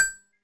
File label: difficulty button